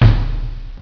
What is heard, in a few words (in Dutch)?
BAF, Klappen en Vallen